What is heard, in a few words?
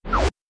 shark swim in